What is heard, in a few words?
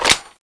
leap strike gun change end